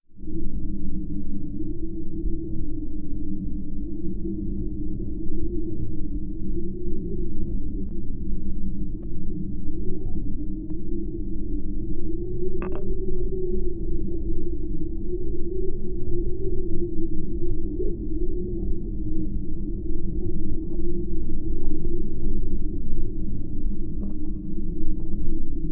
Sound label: Geofon, Wind, Spooky, Haunting; underground sounds